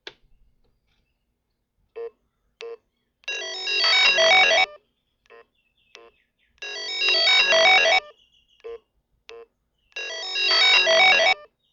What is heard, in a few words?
Maxtor 6L300S0 motor cannot start; Maxtor failures